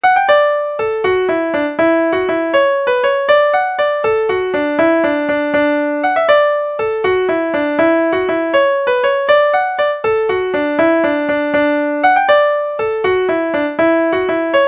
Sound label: irish jig; show boy